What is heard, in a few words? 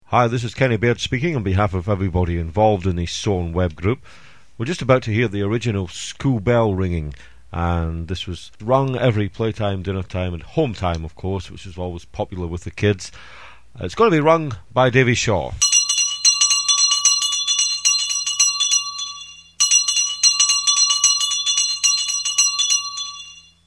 full bell